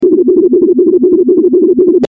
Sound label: Spaceship